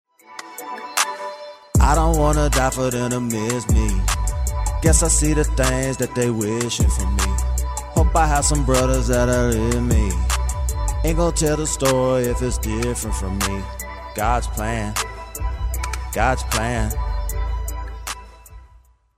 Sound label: Sings